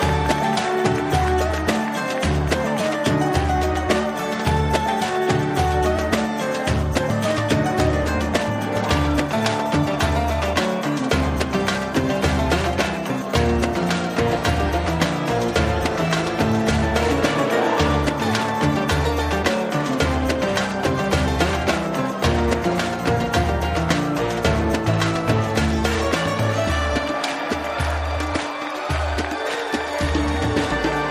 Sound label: Free Bg Bgm